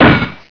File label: impact metal; valve